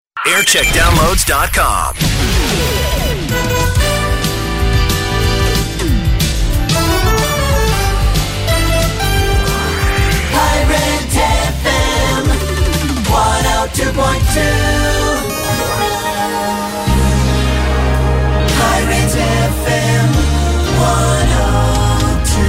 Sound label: Jingle Montage; Pirate FM; JAM